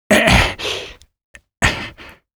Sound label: fish hook grunt, fisherman; lostcoast